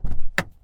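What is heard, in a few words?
car door open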